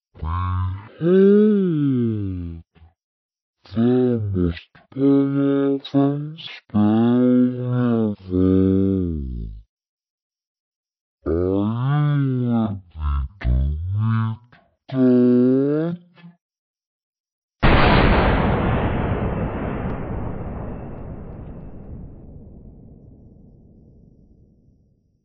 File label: powerful spell; vapesquared